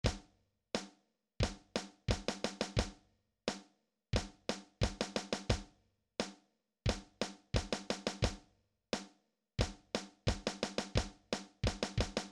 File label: Bb Major Scale, drums